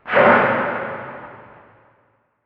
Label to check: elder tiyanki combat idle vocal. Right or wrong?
Right